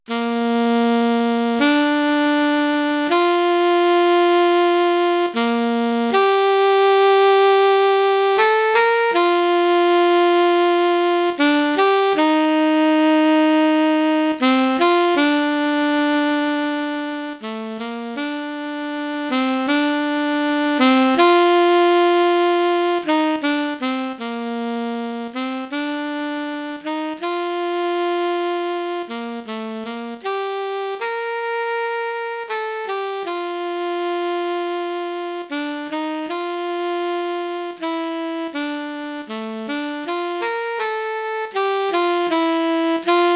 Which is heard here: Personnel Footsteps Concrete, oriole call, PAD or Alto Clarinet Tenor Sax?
Alto Clarinet Tenor Sax